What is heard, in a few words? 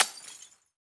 breakglass